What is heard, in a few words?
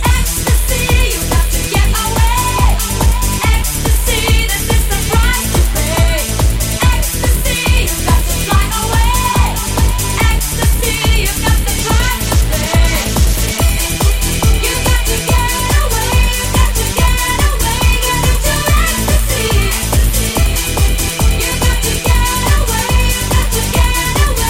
future beat